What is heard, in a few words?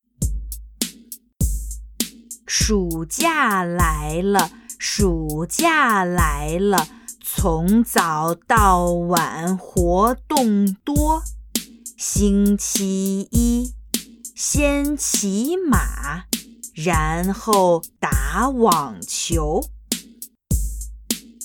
Chant